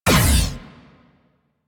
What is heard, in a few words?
Gatling Laser Shot; Railjack